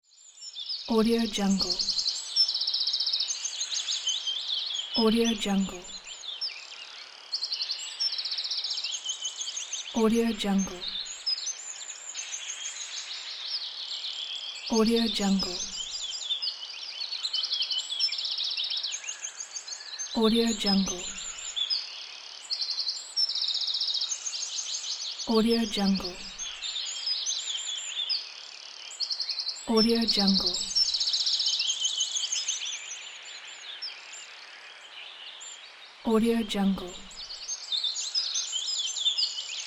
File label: birds